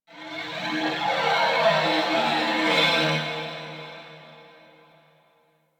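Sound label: ship energy moan texture medium; Ambience; Warframe; Corpus Ship Remaster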